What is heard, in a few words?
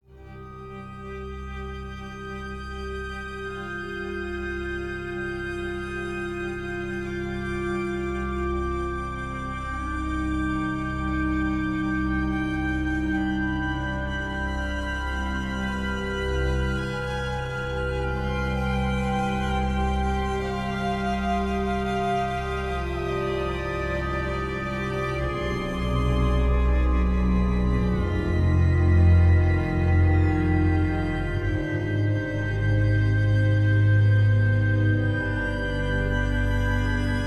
Cautious; the walking dead michonne 2 give no shelter